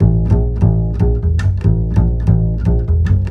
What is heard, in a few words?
145 NuJazz Bass A maj; Pro Tools Essential Loops; Digidesign